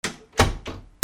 DOORS

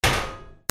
BANGS

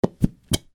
BATHROOM

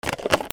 HOUSE

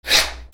PAPER

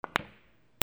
BILLIARD

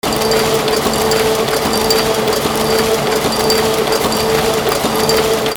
INDUSTRY

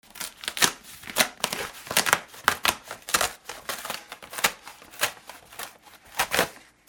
RIPPING

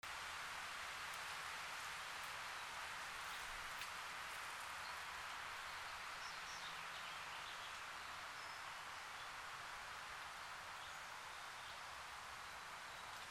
AMBIENCE

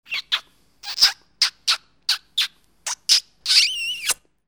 CARTOON

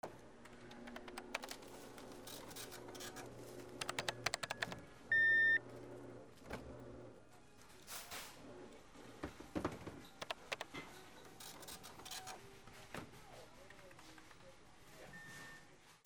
OFFICE

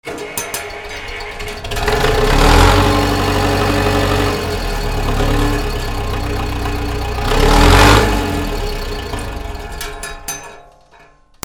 VEHICLES

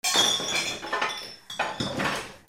BREAKING

